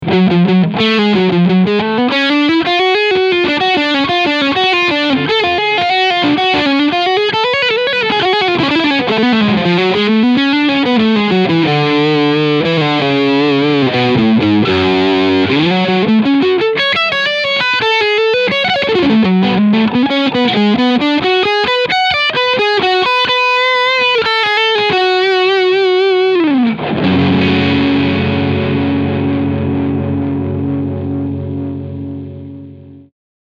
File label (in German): Overdrive Steg PU